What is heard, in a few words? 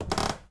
CREAK Wood Hollow; mono; Universal Sound FX